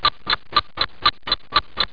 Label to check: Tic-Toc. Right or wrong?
Right